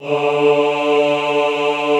AHH D2, Classical Choir; Best Service; Extended Classical Choir

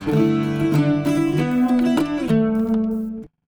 Bouz Down, Acoustic String; Sonic Foundry (Sony Creative Software); Friendly Fire

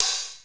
Hats and cyms, Funky Drums, Kit hits

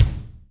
BD, Yamaha DTXpress Acoustic Kick; BDpalmer

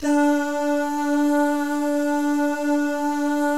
DAH, Vocal Jazz; ILIO

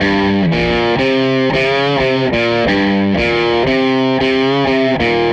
Les Guitar Loop

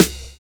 LIKE 808

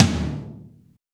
TOM, Drums; Best Service; Real Mega Drums VOL-1

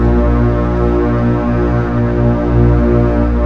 BASSES, STRINGS; Rubber Chicken Software Sample Bank; REAL INSTRMT

